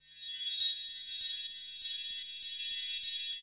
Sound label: prc, Ambi Perc, Chillout; Best Service ProSamples vol.36